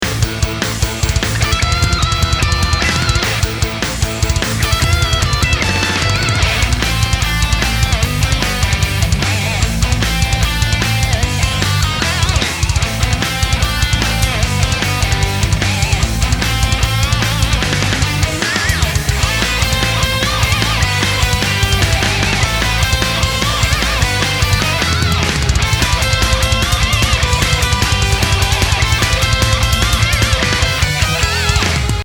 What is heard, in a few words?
Metal Boss Loop; Sonic 2